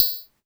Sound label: polyvox perc, Polivoks; Formanta Radio Plant